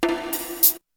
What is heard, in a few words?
BONGO HT; BEAT2000